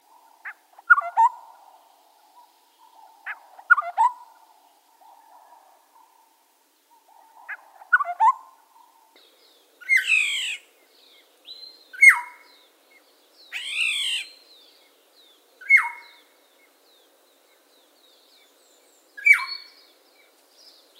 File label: Black Headed Oriole